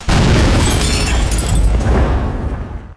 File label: designed explosion with glass shattering